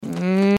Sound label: groan; pony; Twilight Sparkle